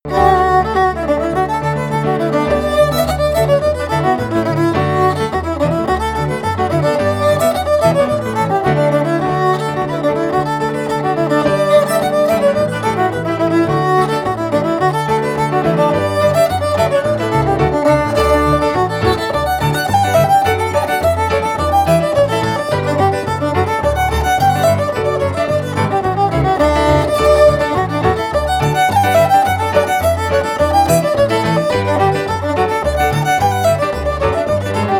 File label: fiddle; SeamusGrantFestival; tune tutor